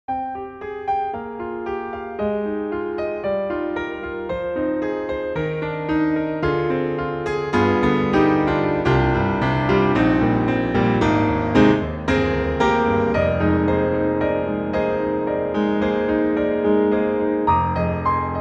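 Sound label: CFX CONCERT GRAND, Classic, piano; GARRITAN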